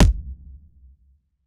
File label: kick verb; kicks